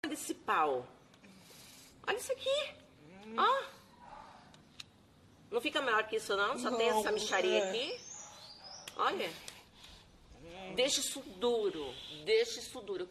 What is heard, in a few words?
ahhhh